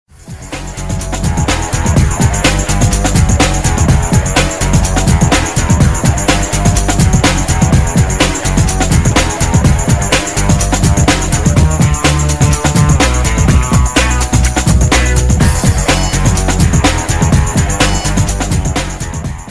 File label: techno; james bond 007